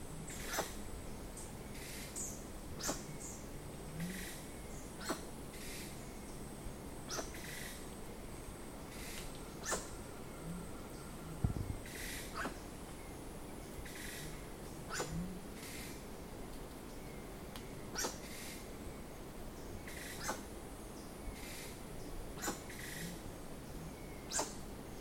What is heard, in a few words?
Sira curassow, پرنده کاکل فری سیرا